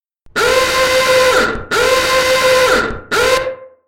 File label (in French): son vieux tacot, klaxon